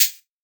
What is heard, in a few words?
hat; Anthemic